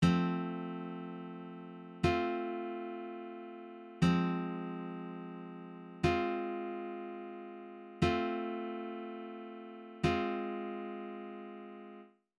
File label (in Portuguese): Estudo de Harmonia acorde de sexta de subdominante